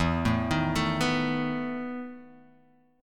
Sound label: E Major 7th Suspended 4th E, Guitar, Open G; chord shape